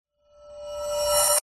sound design cymbal reversed pitched up suspense climax